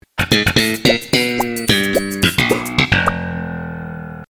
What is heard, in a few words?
sitcom transition bass riff; seinfeld